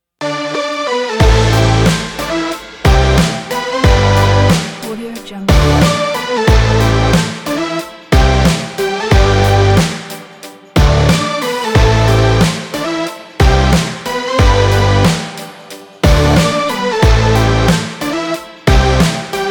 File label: upbeat indie